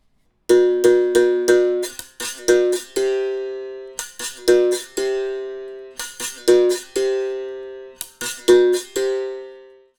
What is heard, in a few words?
gunga solo, berimbau; gunga C solo